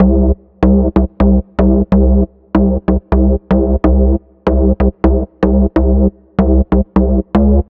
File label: Slide bass, break beat